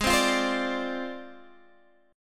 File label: D Minor 7th Flat 5th G sharp